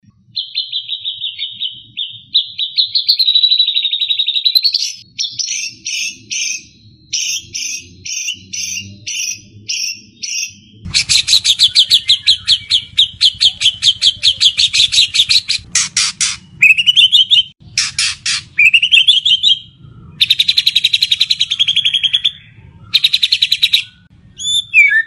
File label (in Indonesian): murai batu isian cucak jenggot